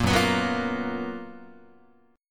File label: F sharp Major 11th Guitar; F#M11 Chord; strummed; 1,2,2,4,2,4